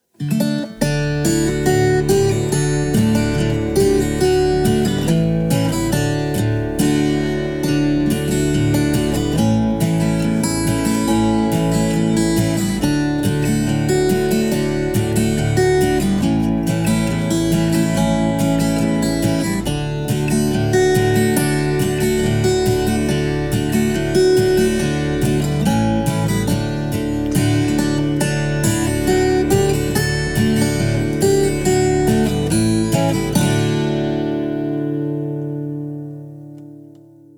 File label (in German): lied der kuckuck und der esel karaoke; mini spatzenpost; Schuelerzeitschriften